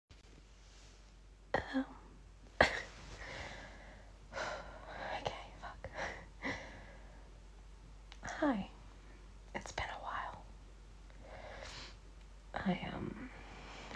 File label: Find Me Sleeping, Wet Noises, Toy Noises, Bed Noises